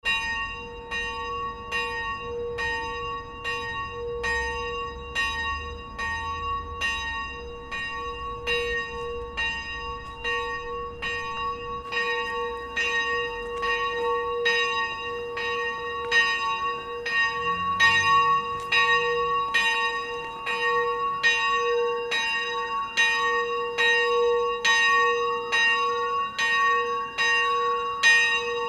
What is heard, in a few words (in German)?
Kirchenglocken